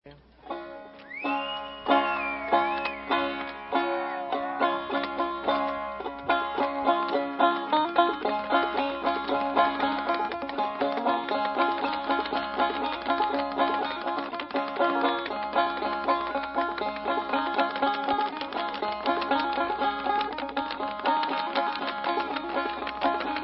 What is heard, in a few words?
instrumental